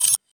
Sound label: terminal, sci-fi; sci-fi sfx